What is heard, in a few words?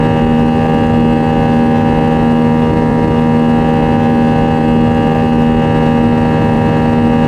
cfm buzz, CFM56; wing aft; CFM56A; A32X